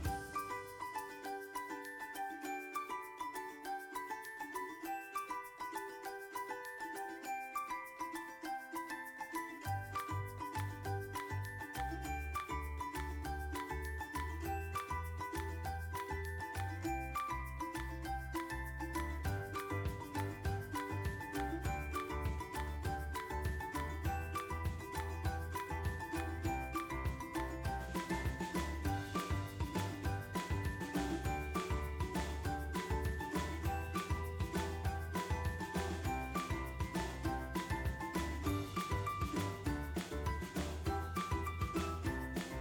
music cheerful; bgm

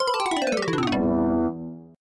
game lose effect

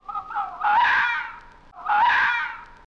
fox

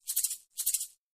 maracas

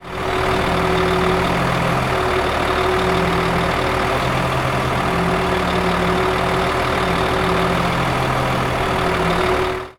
crane

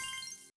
pop up

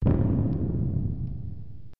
bomb drop

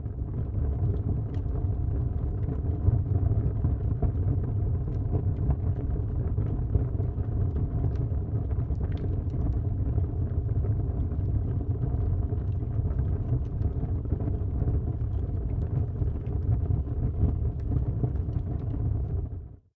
fire

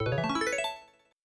game win

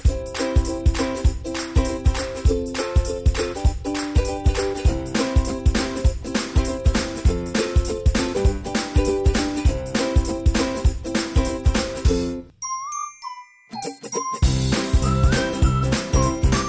music cheerful; bgm

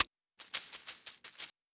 scorpion effect

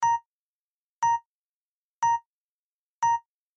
game start countdown

